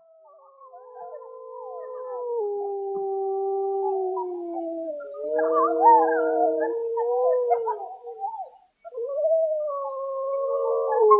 Iberian wolf pack howling at night Lobos ibéricos aullando en la noche; DURMIENDO CON LOBOS